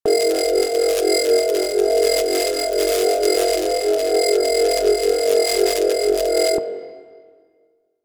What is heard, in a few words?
Infested Hologram Glitchy Loop; Ambience; Props; Warframe; Corpus Infested